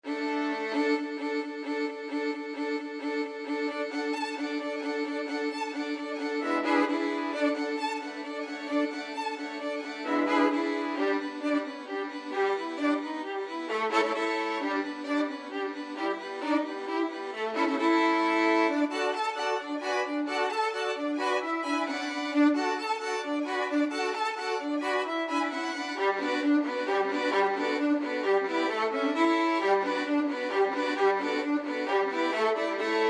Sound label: romanian folkdance, violin quartet; bartok